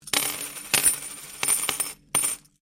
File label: Coin Penny Multiple Drop Laminate